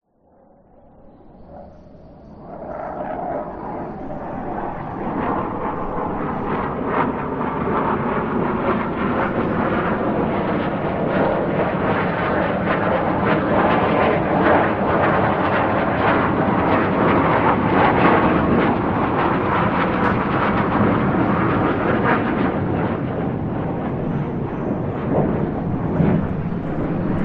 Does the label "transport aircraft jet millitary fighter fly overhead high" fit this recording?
Yes